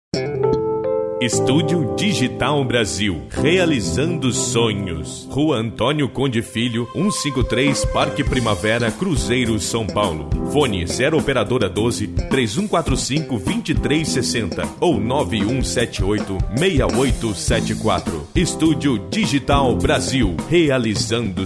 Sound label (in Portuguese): chamada